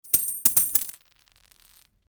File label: Coins dropping